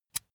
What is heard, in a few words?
pull out usb cable